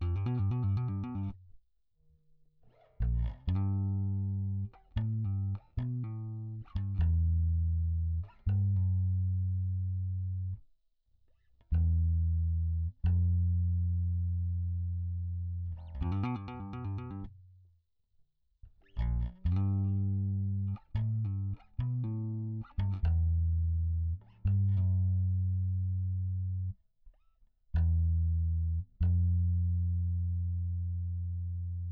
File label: bass